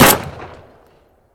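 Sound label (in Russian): выстрел неосторожный оглушительный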